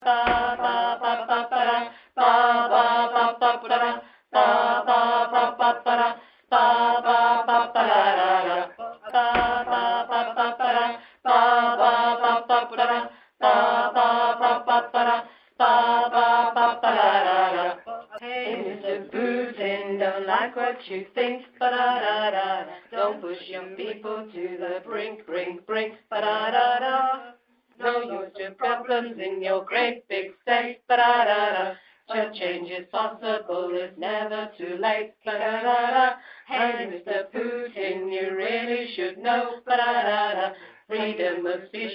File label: Tenor